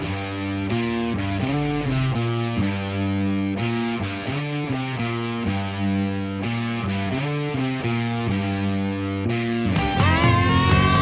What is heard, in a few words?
BGM; Master Juvenile Home Entry